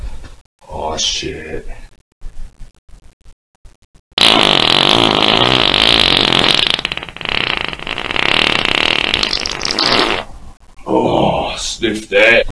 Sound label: fart on dog